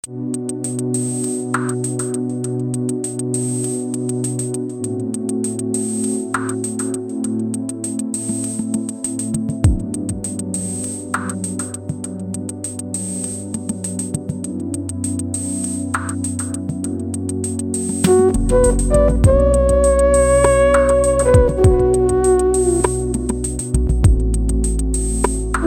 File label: MS20; Push-Pull; MS20 for Couch-Potatoes